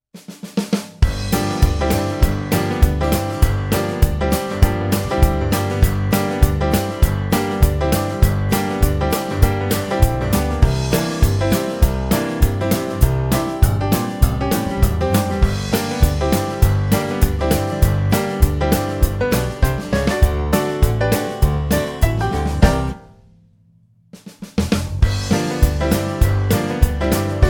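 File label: country blues g, banjo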